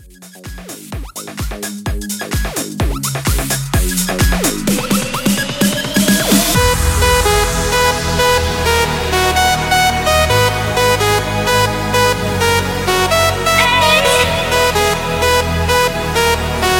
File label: Hybrid, 128